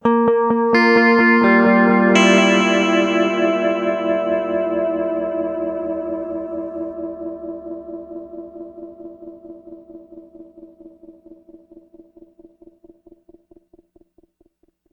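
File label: clean guitar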